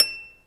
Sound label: HRP C. HARP; Roland - LA Composer vol. 1